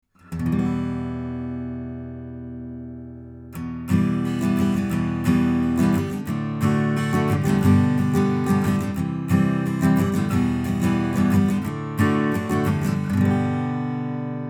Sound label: Omni Strumming A